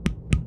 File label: laser sight; midnight guns